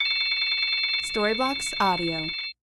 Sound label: cell phone smart phone ring